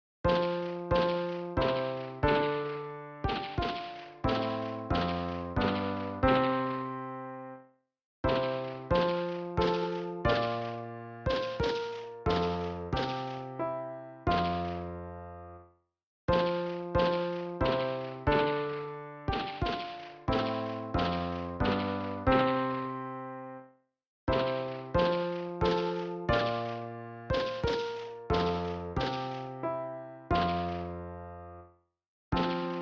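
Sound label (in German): tenor, Übehilfen für das Erlernen von Liedern; ref gesangbuch; rg 276 such wer da will ein ander ziel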